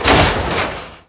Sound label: crash; roadwars